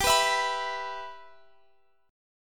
B Suspended 4th Sharp 5th G

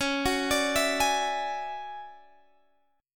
D Major Sharp 11th C sharp, chord

